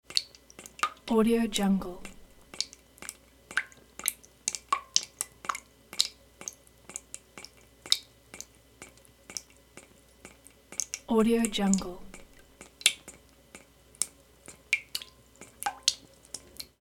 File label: leaky water faucet dripping